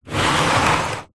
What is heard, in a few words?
wood box heavy slide concrete